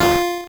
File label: core squish